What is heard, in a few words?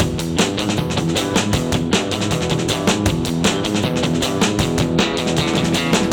Full Mix